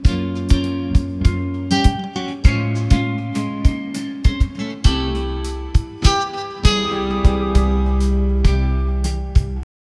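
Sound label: drums and guitar in harmony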